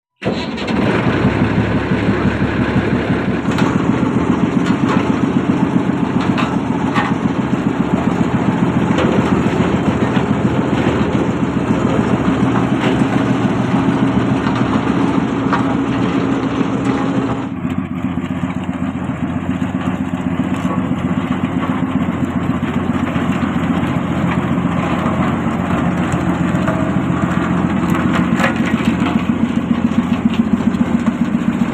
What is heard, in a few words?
Road roller